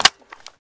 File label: mag locked, AR-10T